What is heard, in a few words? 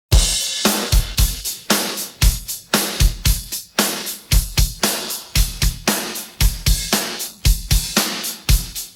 pattern, drums